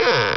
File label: Chest & Door Open; Ultima III v1.2; Ultima Sounds